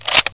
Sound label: Enfield clip in, weapons; dod